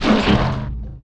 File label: Goblin